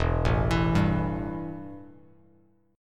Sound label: E Minor Double Flat 5th E, Bass Standard